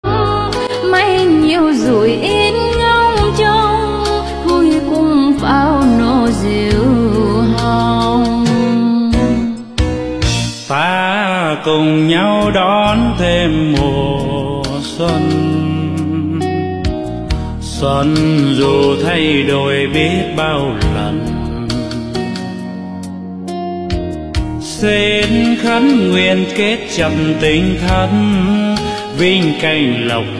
Nhạc Xuân Bolero; Hải Ngoại; Mừng Xuân 2021

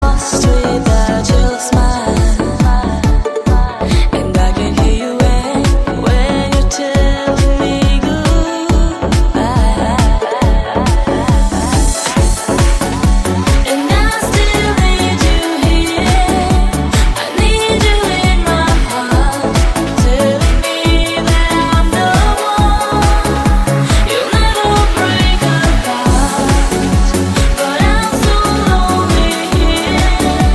Nhạc Chuông

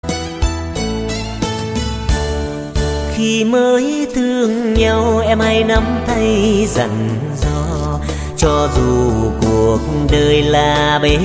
Nhạc Chuông

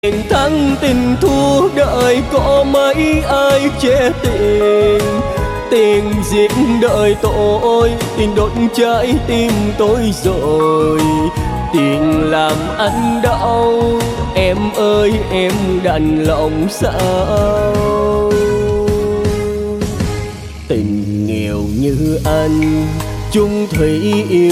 Nhạc Chuông

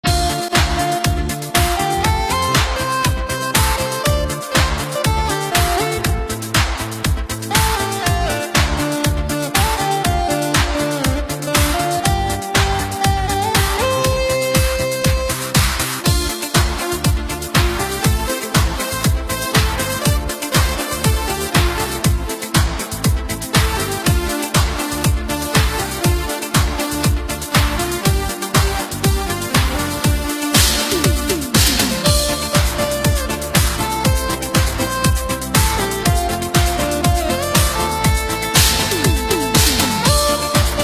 Liên Khúc Nhạc Sống Organ Không Lời

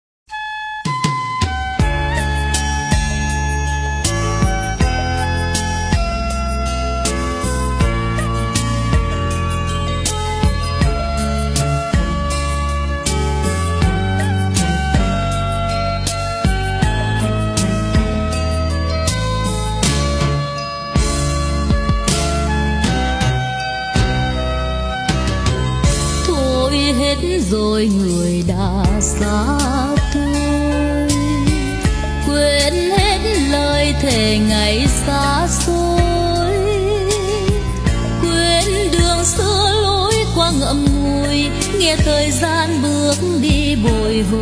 Liên Khúc Nhạc Trữ Tình Nhạc Hải Ngoại

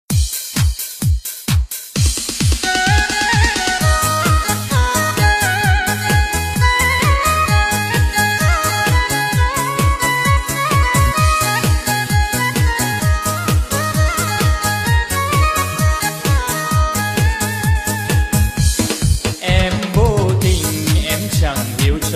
LK Nhạc Sống Hà Tây Nhạc Sống Thôn Quê Bolero

